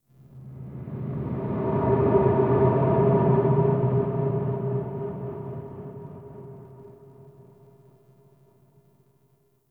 Wind Blow; Warframe; Tau

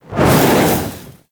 Look Whoosh; Gameplay; Ballas; Warframe; Chimera Prologue

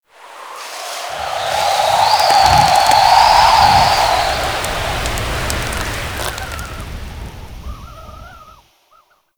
Settlement Wind Debris One Off; Warframe; Grineer Settlement

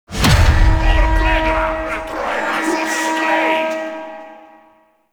Extraction Alarm; Gameplay; Awakening Remaster

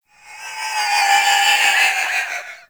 Sticky Ink Vocal Call; Gameplay; Mutator; Warframe; Shadowgrapher

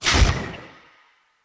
Charged Punch Whoosh; Weapons; Unarmed; Fey

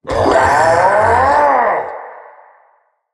Fearful Cry; Battle; Mendicant King

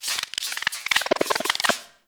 Venus Robo Fish Medium Caught; Warframe

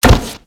Step Metal, Footsteps; Warframe; Grineer

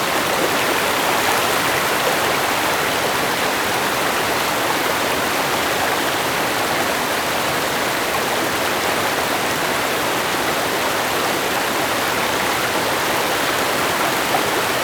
Water River Loop; Duviri

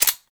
Sniper Rifle Mech; Grineer; Female; Weapons

